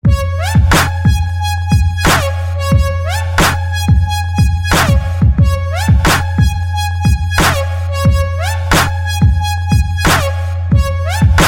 Instrumental

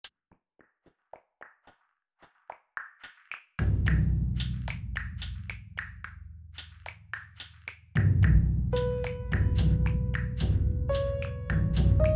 Distant Thunder Rumbling

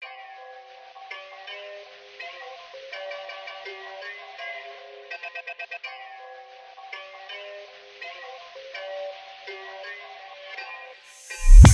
phonk

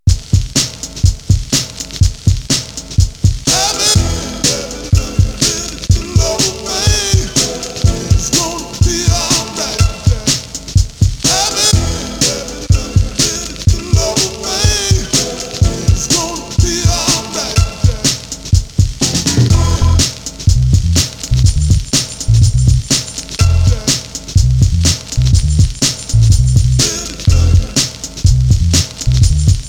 Instrumental

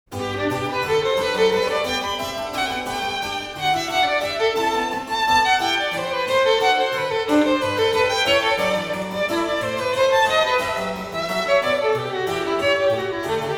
Violin Sonata in G Major; BWV 1021; II. Vivace

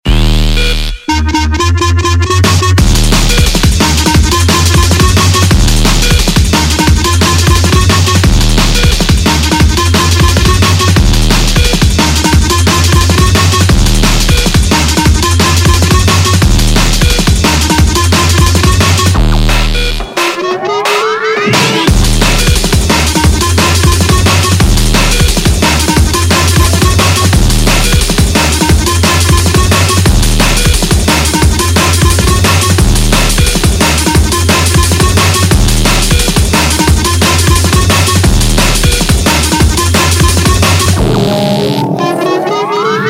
Drum Bass

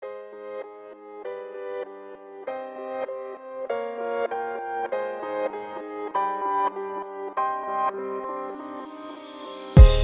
Beats, RnBeat

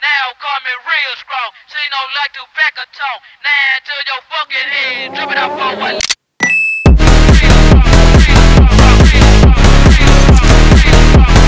Drift phonk

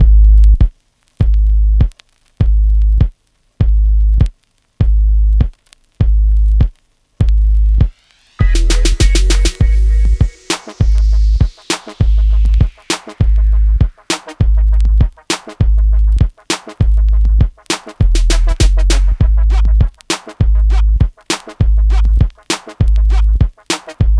Riddim

